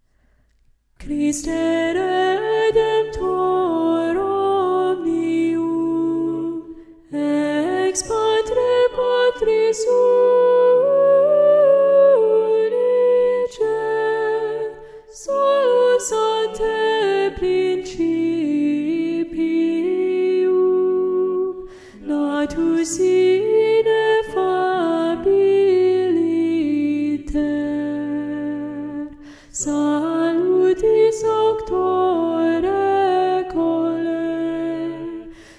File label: Soprano; Christe Redemptor Omnium; Practice Room